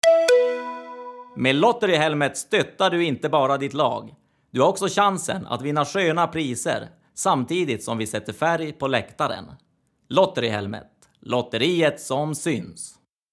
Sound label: jingel